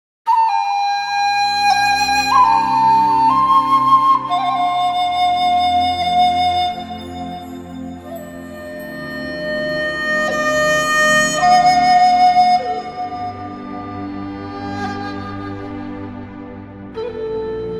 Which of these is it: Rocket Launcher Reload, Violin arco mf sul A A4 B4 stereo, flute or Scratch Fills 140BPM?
flute